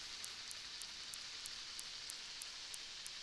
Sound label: clicks